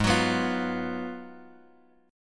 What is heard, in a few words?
G sharp Minor 13th; G#m13 Chord {4 X 4 4 X 1} strummed